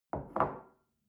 shell place on table soft, gastronomie; berufe; soundpool

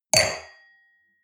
wine glass place table hard, gastronomie; berufe; soundpool

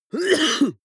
cough male single attenuated; koerpergeraeusche

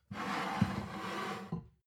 plate stack slide table, gastronomie; soundpool

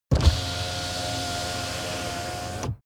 car window back open full

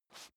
step dress boots metal dry slide short; schritte; Footsteps; menschen

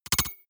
noise metal scratch splutter; maschinen; signale; soundpool

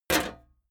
sheet clank damped

